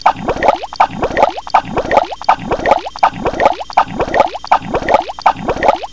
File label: PUMP, MACHINES